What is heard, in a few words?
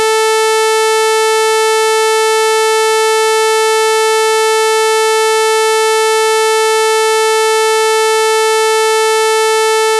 frequency 3150 hz